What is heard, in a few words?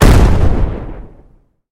explosion